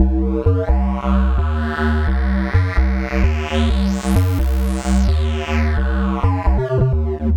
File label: Bass, Breakbeat